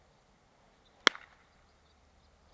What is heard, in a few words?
echo; austin ave